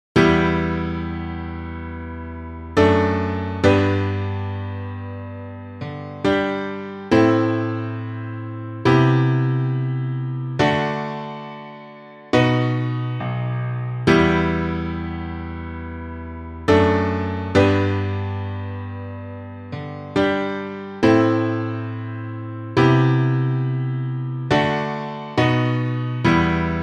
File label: piano